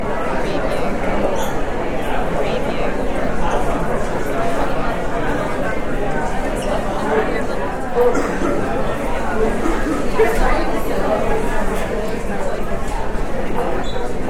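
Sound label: College Campus Union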